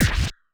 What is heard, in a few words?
Korg 01W MegaDrums; 300 Drum Machines